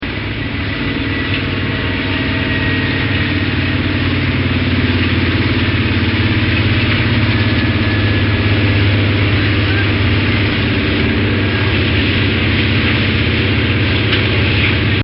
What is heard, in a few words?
Sh-3 Seaking Helicopter Landing; Helicopters; Bobby Cole